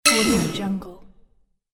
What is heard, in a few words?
vanish throw target